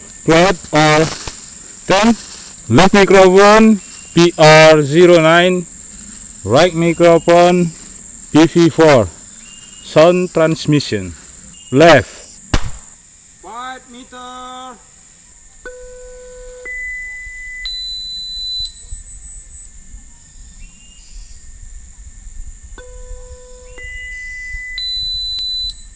birds

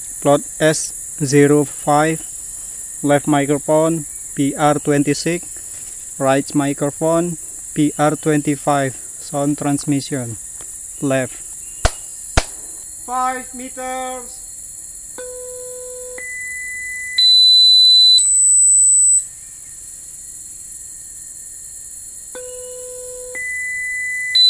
birds

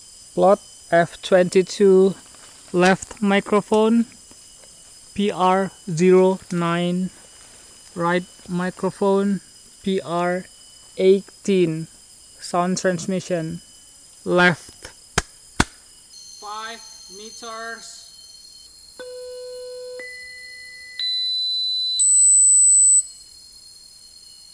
birds

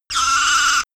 Croak

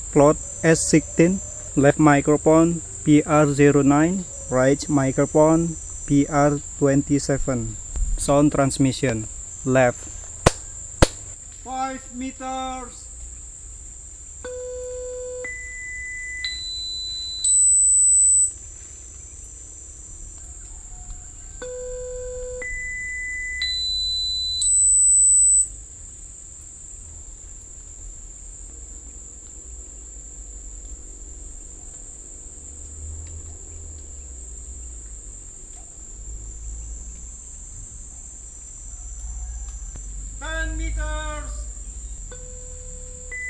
birds

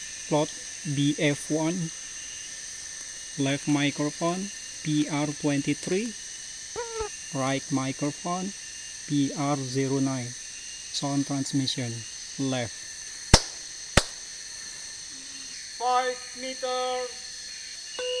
birds

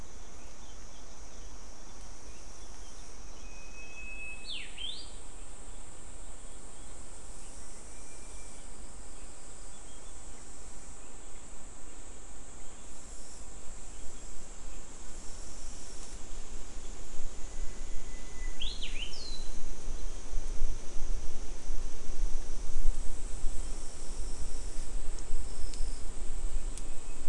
mixture of bird and insect

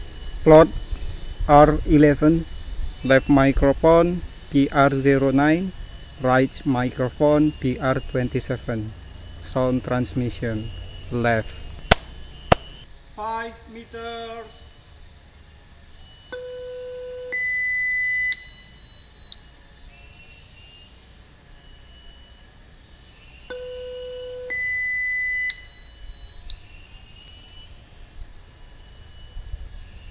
birds

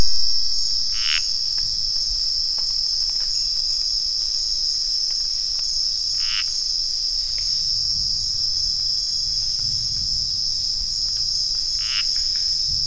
Occidozyga sumatrana; ecoSound-web

